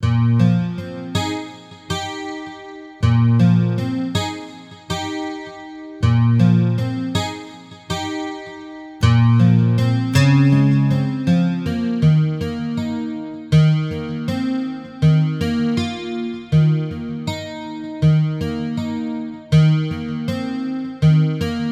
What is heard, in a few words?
Guitar Thing; Ittle Dew 2 OST; Autumn Climb